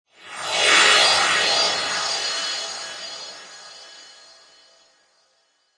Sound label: Magical Twinkle Whoosh; Magic sound of casting spell, conjuring or wizardry. Witchcraft ritual summoning elemental or otherworldly; Lynne Music